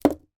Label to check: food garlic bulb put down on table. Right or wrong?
Right